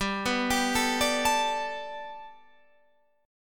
G Major Add 9th, Guitar, Standard; arpeggiated

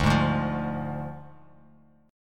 D Diminished D, Bass Standard; strummed

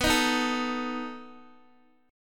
B 7th B down Guitar DADGAD

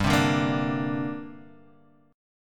D 7th Suspended 4th Sharp 5th, G

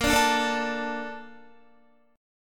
A sharp Major 7th, Guitar; strummed; chordshape

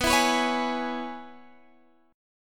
C sharp Minor 7th, chord

